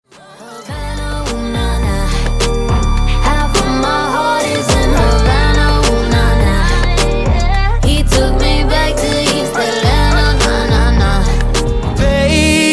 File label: ringtone